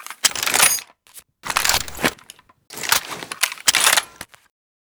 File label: awm reload empty, weapons